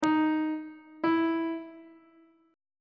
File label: d double sharp 8th interval pitches on bass clef